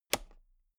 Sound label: toolbox latch close loose regular